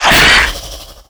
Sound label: attack, antlion; creatures; vox